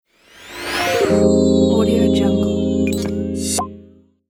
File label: next level ident; alexhattt